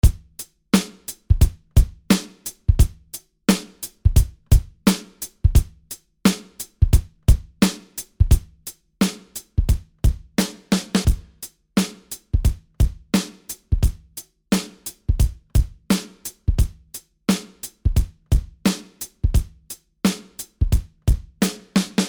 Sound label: Drums Dry Wet; OpenDeck